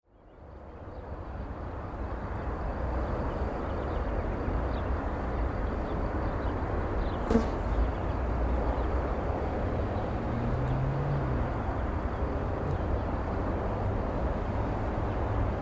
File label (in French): fondbonniere oiseaux autoroute; cartophonie